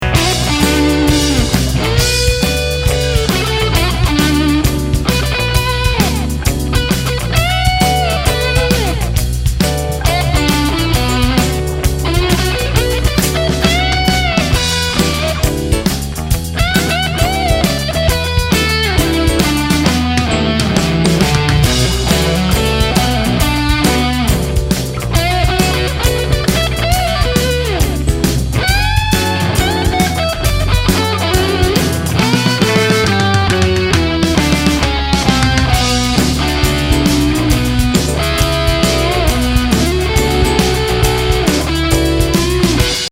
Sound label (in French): avec delay